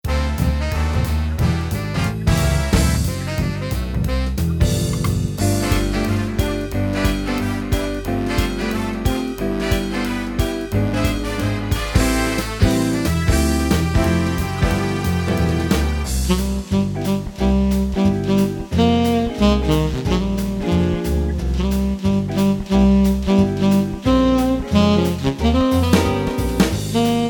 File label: Tyros4 Big Band Fast; vArranger